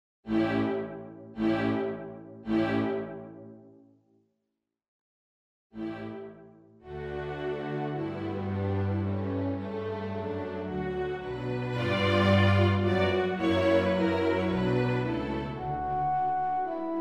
Piano Concerto; Beethoven

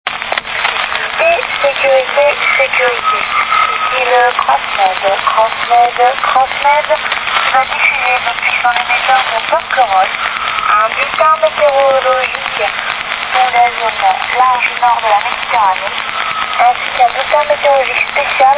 2182 KHZ; CROSS MED

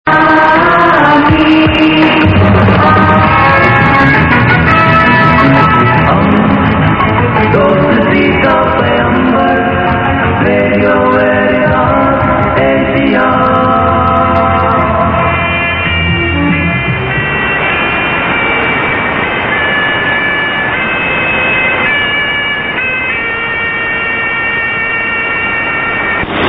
JINGLE CANTATO; RADIO VERITAS ASIA

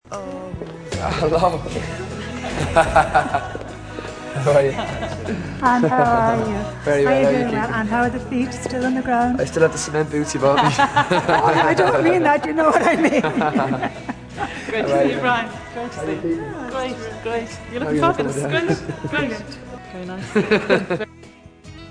Laugh

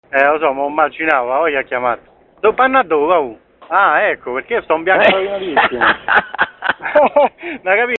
Ahah

